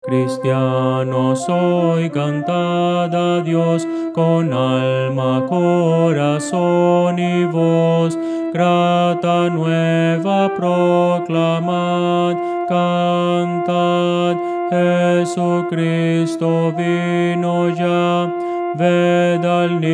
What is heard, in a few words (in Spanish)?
contralto